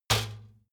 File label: trash basket metal hit